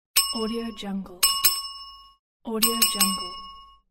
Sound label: hotel desk bell; dellostudio